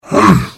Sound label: Hit; The Elder Scrolls III - Morrowind